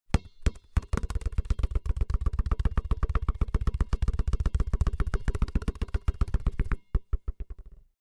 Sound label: Sports Basketball Dribble Quick